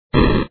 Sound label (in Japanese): bakuhatu; escape